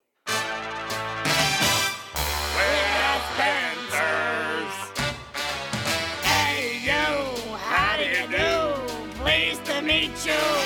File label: Songs Comedy & Drama